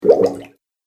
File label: Goop Sound, cartoon